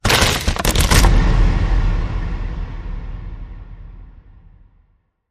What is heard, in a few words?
Creaking Floor Hit